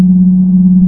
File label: strider buster idle loop; hl2 ep2; ambient, levels, outland